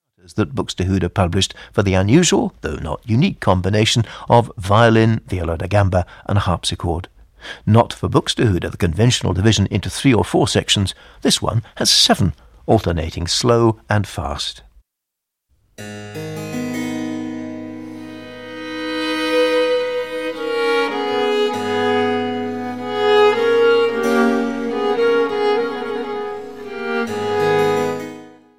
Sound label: sonata in a minor for violin viola da gamba and keyboard; dietrich buxtehude; buxwv 254 op 1 3